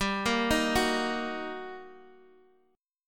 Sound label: G Minor Major 7th Sharp 5th G; arpeggiated